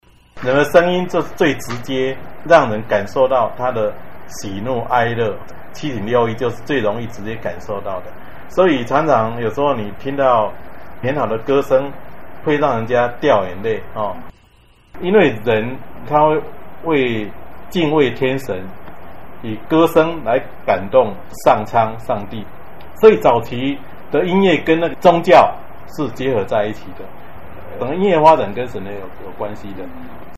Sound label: Vocal Music